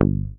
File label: HOFNER MUTE, Bass